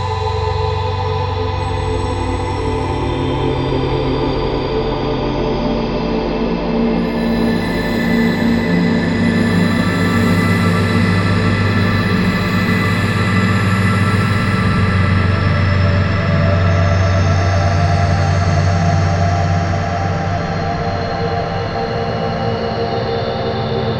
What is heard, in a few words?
Sounds of Space; Julian Ray; The Finest FX